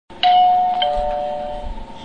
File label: chime